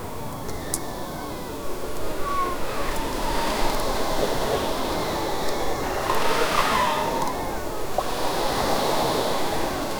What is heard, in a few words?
crisp golden beer, mug